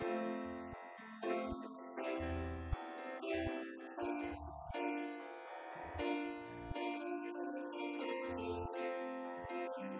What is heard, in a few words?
nodrums inst sim; SiSEC08; SiSEC underdetermined